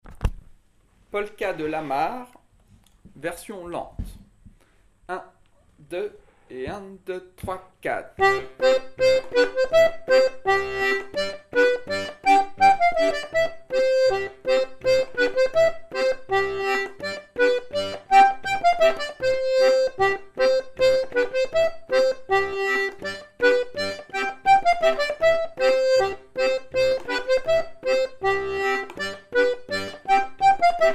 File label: polka